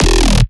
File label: bass hit acid whomp E; Virtual Riot